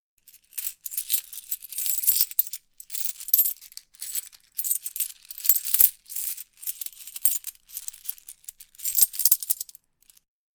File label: Coin Rattle; Many coins being dropped onto one another, dense coins rattling sound.; Money Sound Effects; Lynne Music